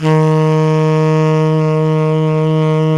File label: SAX TENOR, Roland Super Sax; Roland LCDP07; Tenor V-sw; Tenor 4way